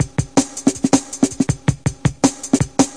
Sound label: 161 outdoors, drum loops and breaks